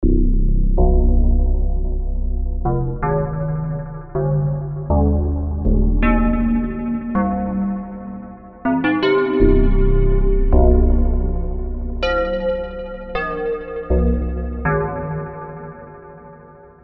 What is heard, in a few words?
FM Strong Bells; WMF